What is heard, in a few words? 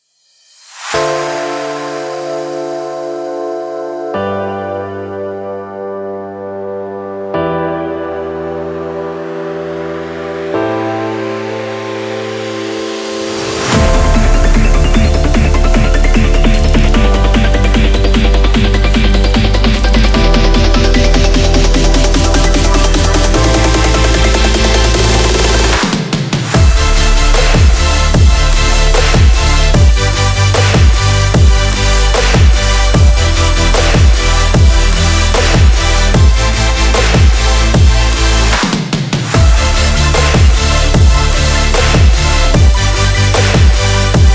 drums & bass